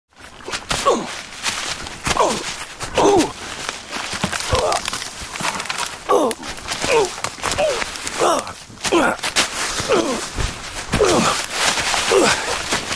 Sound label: Tumbling Man, Man falls down woodland slope.; Pain; Voice